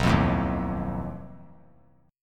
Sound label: B 7th Flat 5th B, chord; B7b5 strummed; 11,12,12,13; JGuitar